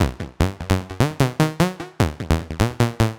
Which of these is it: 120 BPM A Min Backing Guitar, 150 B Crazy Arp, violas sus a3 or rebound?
150 B Crazy Arp